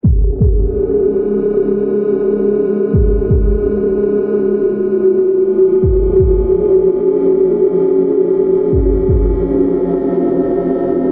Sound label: Atmospheric Nightmare